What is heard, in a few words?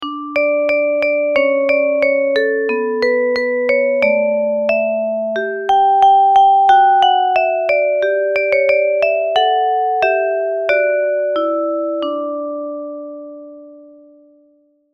ringtone